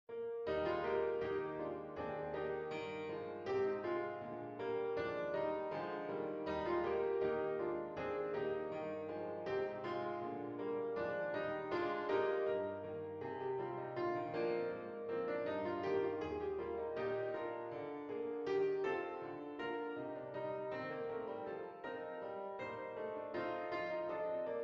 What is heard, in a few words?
Solo Predominant; Zion Hort; Wachet auf, ruft uns die Stimme; J.S. Bach; Cantata 140; Tenor